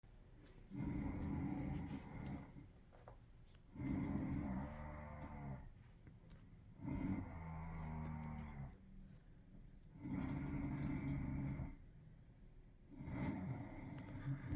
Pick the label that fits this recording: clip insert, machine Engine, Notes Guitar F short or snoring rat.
snoring rat